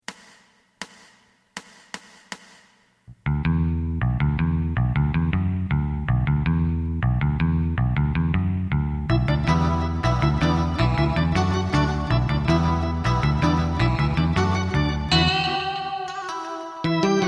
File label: drum